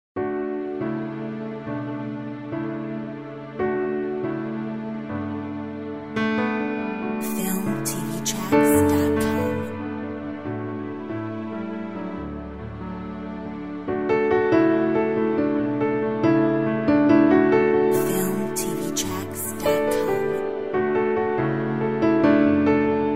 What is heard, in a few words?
Early light Orch